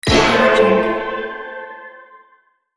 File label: respawn sf fantasy horror character